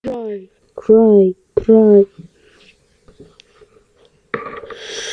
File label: cry